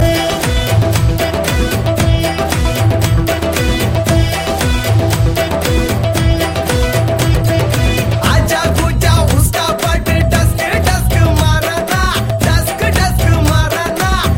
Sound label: Music